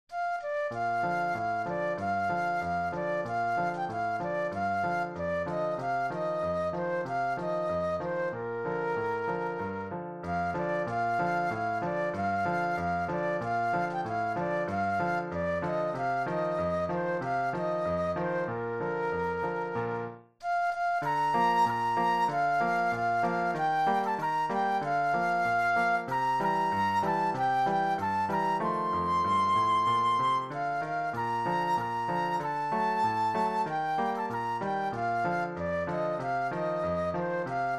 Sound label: The Caisson Song Flute Solo; patriotic; patriotic solos